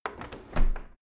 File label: window handle close; objekte; fenster